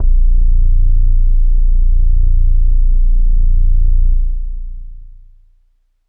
Leslie As-1; organ; Kawaii

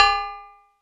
Cowbell

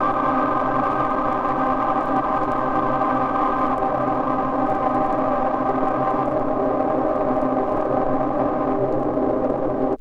Polaris Rave Air Vinyl Synths C2; vinyl chords

